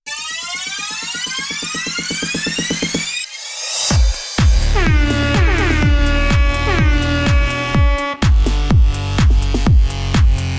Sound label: Macho Trance